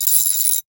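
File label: Ratchet; 1001 Sound Effects; Tools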